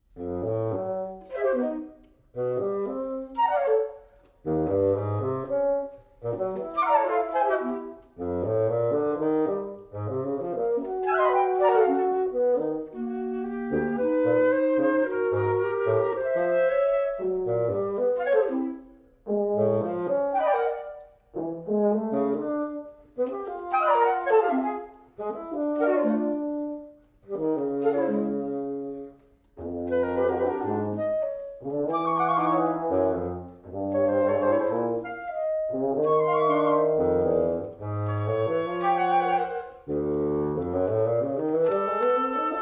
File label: wind quintet, allegro